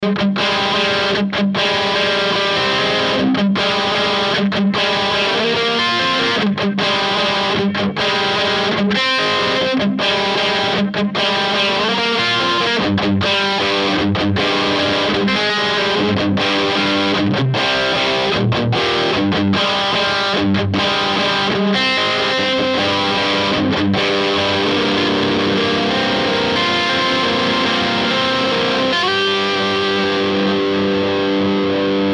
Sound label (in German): Ibanez RGD71AL Zerre Bridge